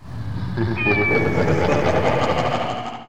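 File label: EVIL laugh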